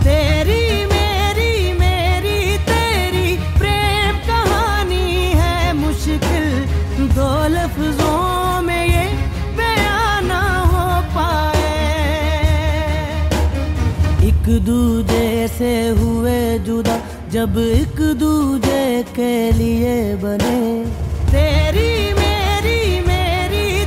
Male Ringtone

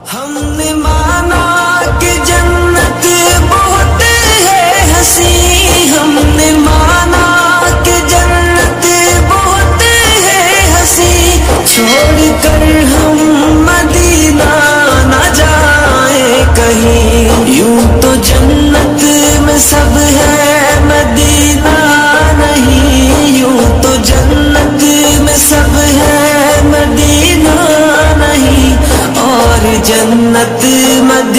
New Naat Ringtone

